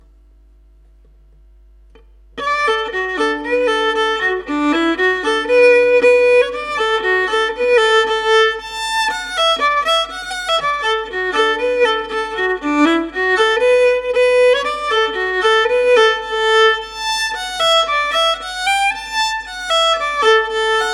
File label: fiddle